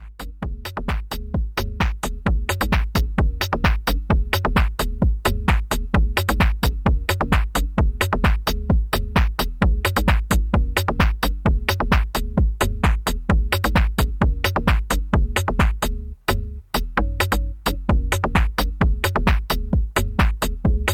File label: Bell; detroit